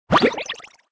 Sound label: Burst, notifications; Itel it1406